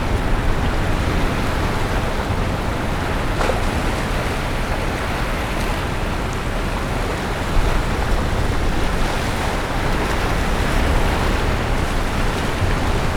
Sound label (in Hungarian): úttest mellől a kógat tetejéről, a végén beszéd, óceán; orzola